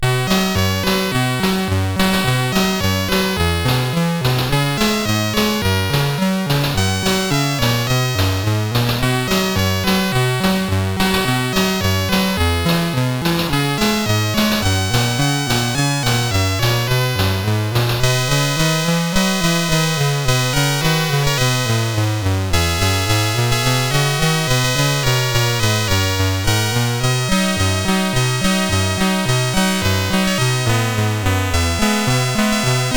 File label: Jingle Bells, sid CSG8580R5